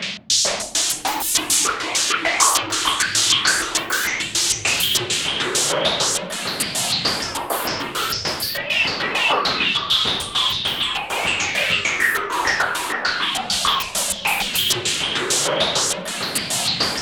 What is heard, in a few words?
WHITE NOISE BT; Zero G; Malice In Wonderland